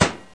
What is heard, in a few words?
hit; madcars2; radicalplay